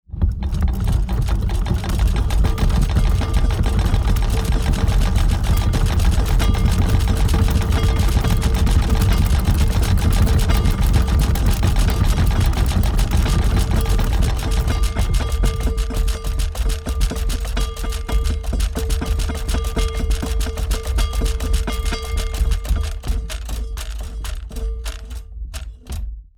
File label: Sliding machinery rotating belt